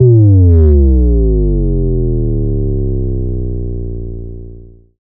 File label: Bass Drop; SoundsCrate